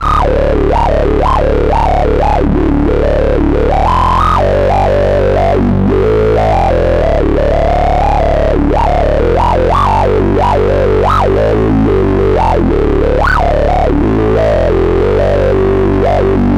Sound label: 24dB LOW PASS SH Distortion Bass; GRP Module